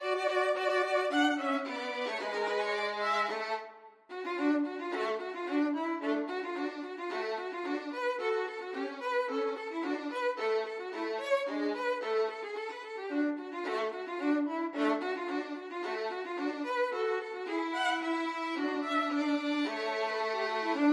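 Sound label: radetsky march violins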